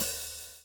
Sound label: SWNG